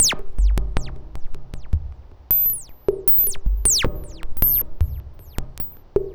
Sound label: Mod Loop, Modular Percussion; Sonic Foundry (Sony Creative Software); Synchro Funk